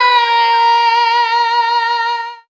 "fly away", Voice

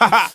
ha ha, ooh ahh; Voice

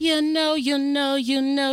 you know you know you know sung

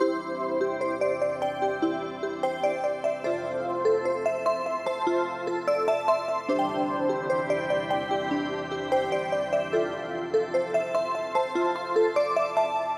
bright and happy, Electronic Loops

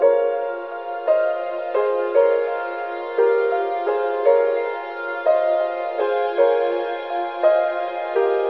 piano, Loops Sad

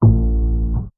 upright, Bass; Notes

